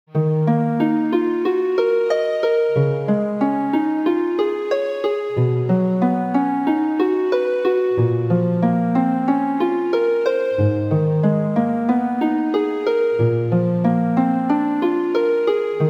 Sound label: harp